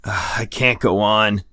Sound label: tired; Escape from Tarkov